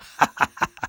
Spy laugh short

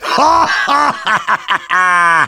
Demoman laugh evil

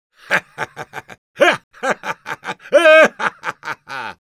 Engineer laugh long, es

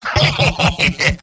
laugh evil

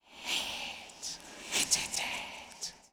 Sword idle

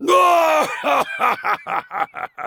Heavy laughter big, fr

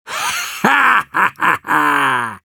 Demoman laugh evil

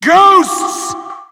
wheel ghosts

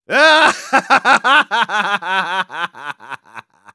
Medic laugh long, de

